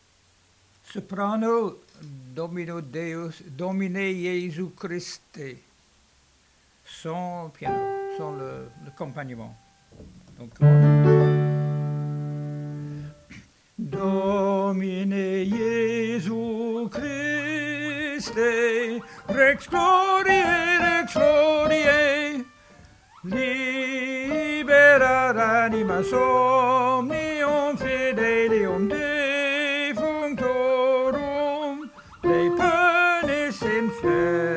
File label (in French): soprano Domine Jesu Christe voix seule; 2020 Mozart Requiem